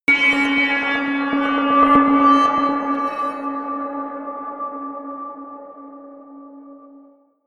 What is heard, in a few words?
melodic metal movement high, drones